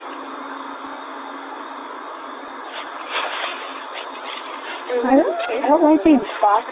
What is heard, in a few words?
singing over my voice